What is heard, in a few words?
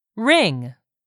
ring